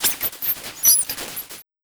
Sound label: TECH 140 FX, Big Beat Drumloops